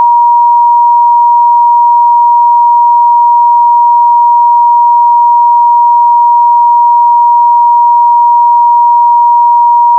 flatline; Apotheorasis

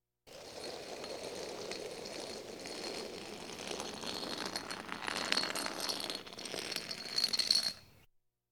Police, Fire engines

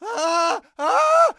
npc male scared; DeadFright

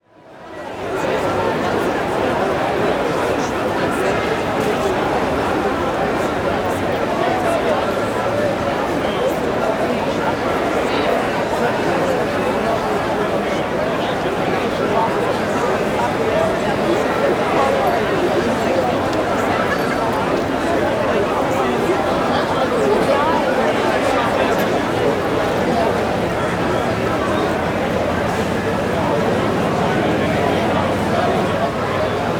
Crowd Outdoor Large Event; crowd walla; Sound Ideas; Walla Series

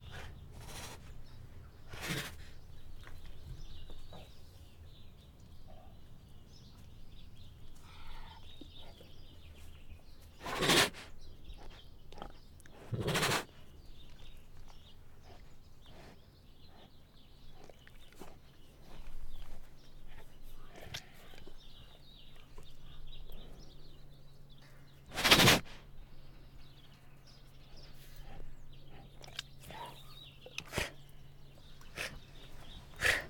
Female Bengal Tiger Hard and Loud Snorts; Tigers; Sound Ideas

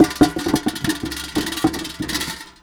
Apples Dump Metal Bucket; SFX Kit; Foley - Misc

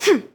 Boxing Female Punch Breath

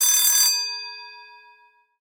bell; firefight

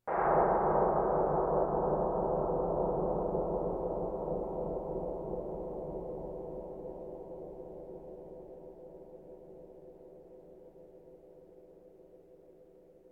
Percussion Bongos Tympani rolls Gongs Drums

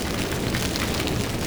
Sizzle Flesh Burning Loop; SFX Kit; Foley - Misc

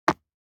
DICE on Neoprene, Drop, Standard, 6 Six Dice; Samples; ArticulatedSounds; DICE Sfx Party (Extended)

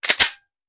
Hand Gun Foley; Sound Ideas; Guns

